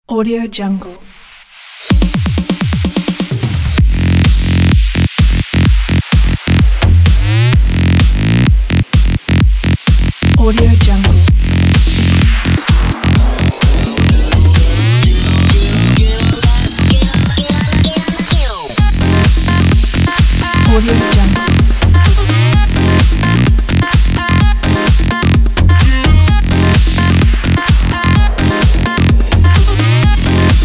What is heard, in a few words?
EDM jingle intro